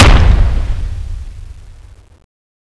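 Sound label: plasma explosion, sound/weapons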